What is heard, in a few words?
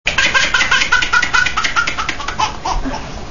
laugh